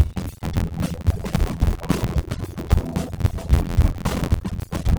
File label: Latino